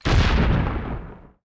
shell hit; Gettysbourg

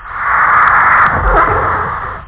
doors; da capo vol1; instruments; startrek

